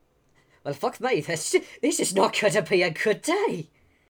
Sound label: Male Reactions